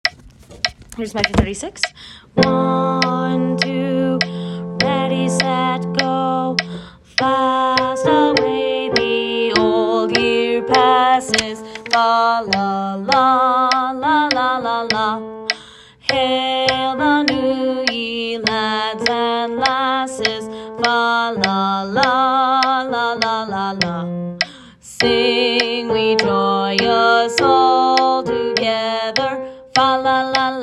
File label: bass